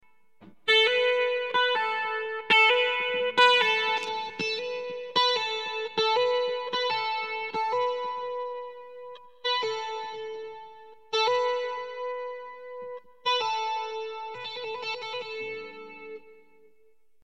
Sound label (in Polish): hammer on i pull off